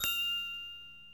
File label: Chime